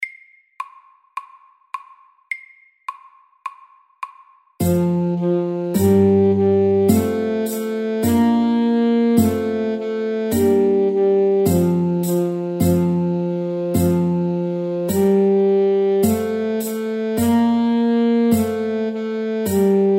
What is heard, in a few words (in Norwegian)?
Folketone MIDDELS